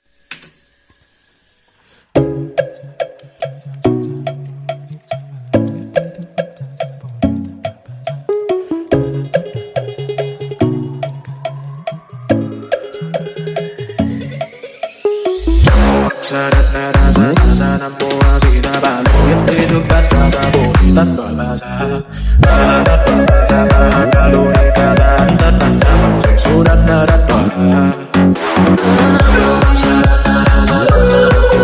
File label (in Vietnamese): nhạc chuông remix; TikTok